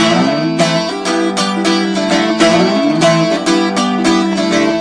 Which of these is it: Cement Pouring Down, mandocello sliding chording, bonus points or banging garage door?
mandocello sliding chording